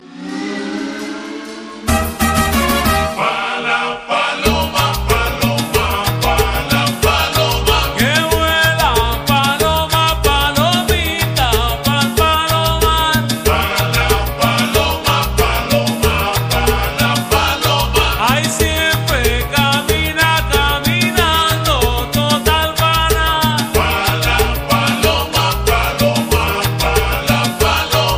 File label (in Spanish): huaracha; latino